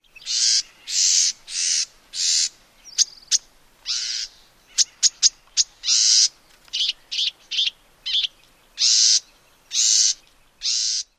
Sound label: various calls